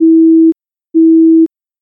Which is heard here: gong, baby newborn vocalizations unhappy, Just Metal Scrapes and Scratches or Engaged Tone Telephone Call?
Engaged Tone Telephone Call